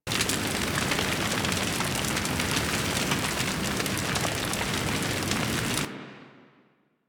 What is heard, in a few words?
Fire Storm Loop; Warframe; Corpus; Arachnoid Camper Terra; Abilities